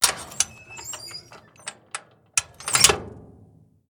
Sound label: Lever Pull